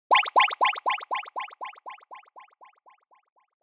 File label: Motion Pop